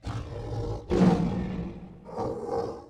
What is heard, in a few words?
Beast